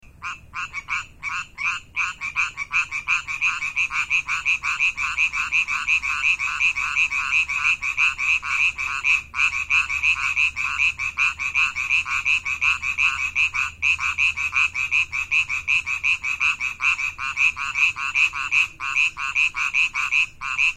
Hyla savignyi

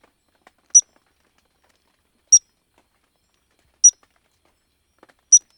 Stumpffia nigrorubra

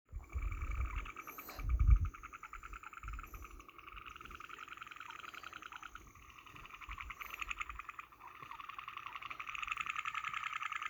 Euphlyctis cyanophlyctis

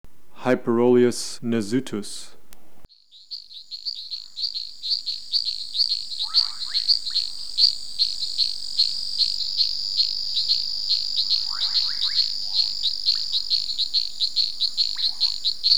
Hyperolius nasutus

